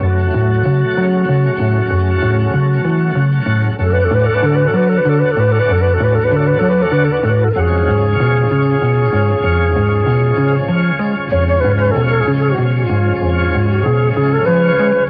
flute and keys, Jazzmatazz Loops

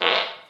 fart short

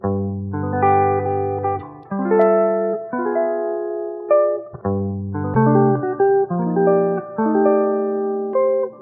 guitar pleasent strokes, Loops; Phonky

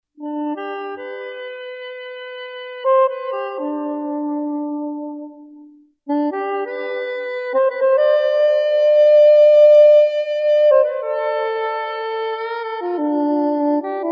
sax mysteria

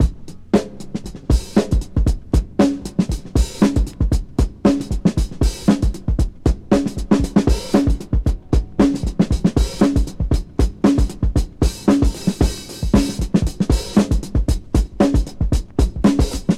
drum time, funky drum loops

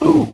"ooh" male

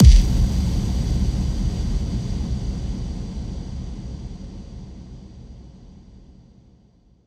electric explosion; Crash Bang Boom

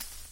whiz, Percussion Swish

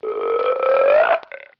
burp short

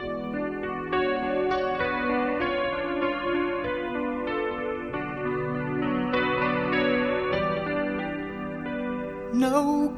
piano, sad loop

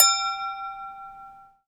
Bells

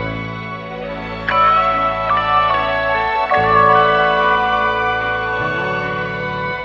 strings; Phonky

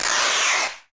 rip; Misc Percussion

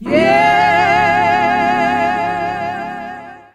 yeah SUNG

